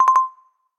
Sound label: cyclist, notifications; Google; Google Sounds; Play It Loud